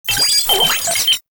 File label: Sniper Drone Vocal; Lasrian Drones